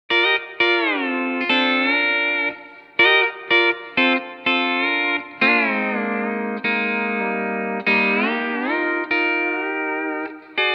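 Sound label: Factory Room Reverb, D13 steel guitar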